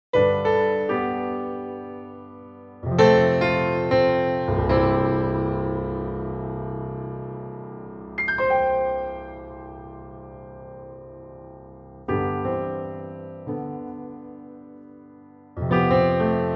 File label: Hamburg Steinway D, piano